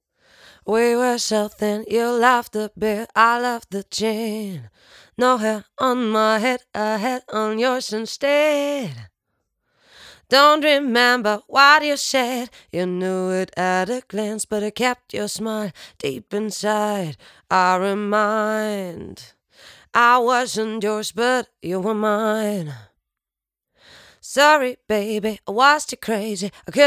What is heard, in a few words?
fem vox